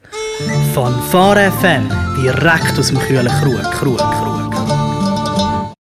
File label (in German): Jingle; Live-Helferbeiz